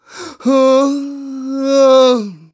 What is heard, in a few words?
Whine; posing grimly; Skyward Sword